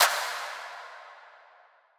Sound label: Subdued Stadium Clap; House; Drum One Shots; Claps; Cymatics